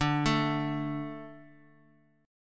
D 5th D, down; D5 arpeggiated; JGuitar

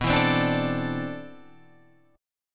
C Major 13th B

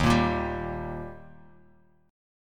Eb Major Eb down Bass Standard; strummed; JGuitar

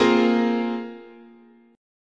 Bb 7th Suspended 2nd, chord, guitar

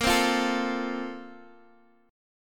A sharp Major 7th Suspended 2nd, Guitar Open D

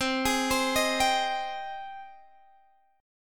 G sharp Major 7th C

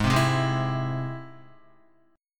Ab Augmented Ab Guitar; Ab+ strummed; JGuitar

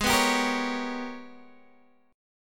Ab Minor Major 11th Ab, Guitar Standard; strummed; x,11,9,12,11,9; JGuitar

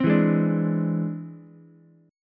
E Augmented 7th E down Ukulele; E+7 strummed; JGuitar

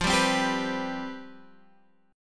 C Major 7th Suspended 4th F

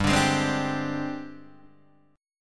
G sharp Major 7th Flat 5th

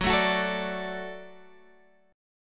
F sharp Minor Sharp 5th, Guitar Open G; chordshape; down

